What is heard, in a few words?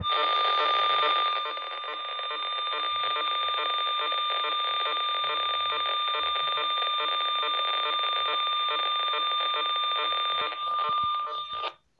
PMR SIGNAL